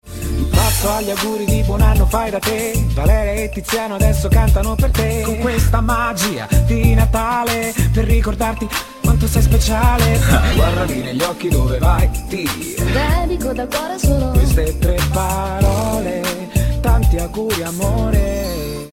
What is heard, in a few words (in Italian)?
Natale, jingle, auguri